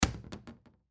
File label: Garbage Can Lid Plastic Shut Bang; Household; Foley; Blastwave